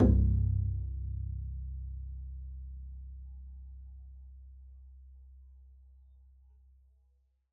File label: pizz c1, double bass